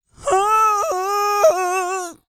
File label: GOSPEL; Spectrasonics; Vocal Planet